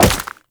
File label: Wall Jump Ice; Footsteps; WallRun; Warframe; Characters; Tenno